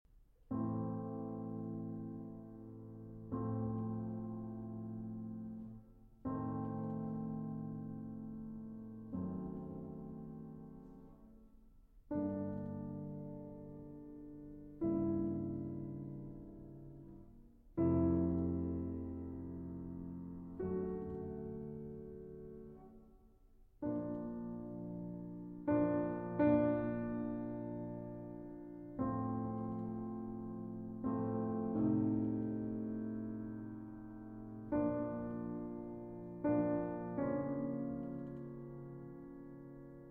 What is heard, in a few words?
Solo Piano